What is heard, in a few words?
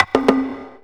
Loops Fills; Vengeance - Essential Clubsounds Vol.2